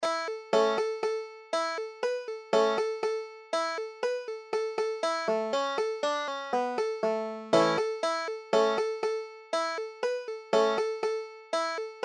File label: boatman, banjo